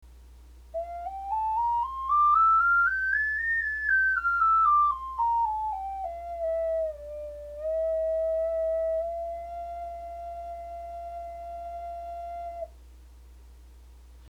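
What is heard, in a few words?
ocarina scale; オカリナ; Ｔオカリナのソノグラムと録音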